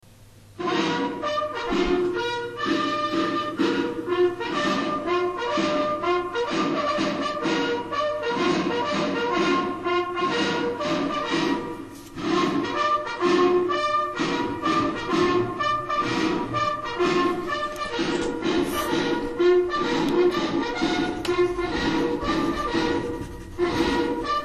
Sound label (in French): Aux champs, Sonneries reglementaires